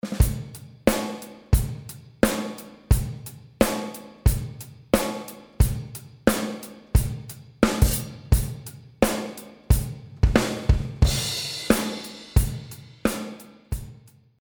drum loop verb reamped

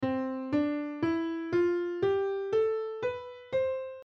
c natural major scale ionian

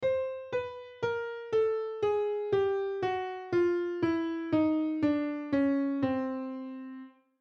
chromatic scale down

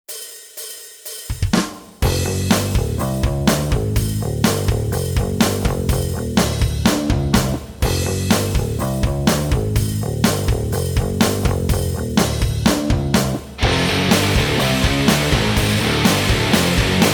drop D backing

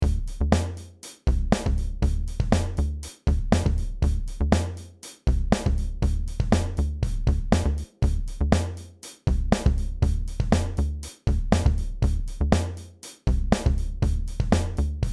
Full compression

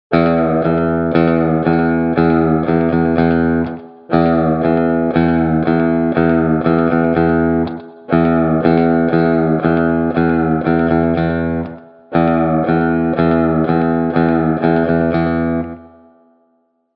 play with a vibrato

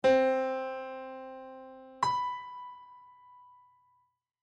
music intervals major fourteenth

